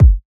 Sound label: clean bass drum sound G sharp key, kick tuned to the G# note